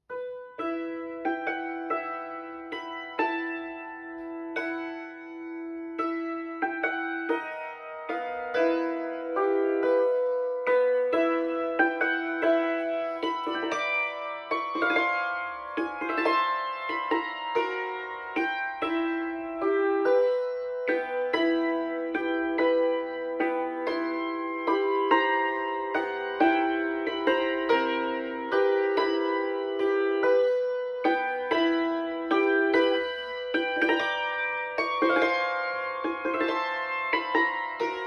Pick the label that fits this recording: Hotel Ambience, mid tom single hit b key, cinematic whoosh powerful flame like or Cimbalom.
Cimbalom